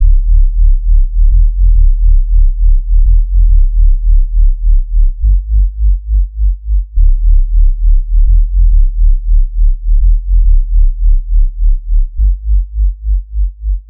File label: Bpm138 Bass Loop Dm; Scope; Prime Loops; Dubstep Illusions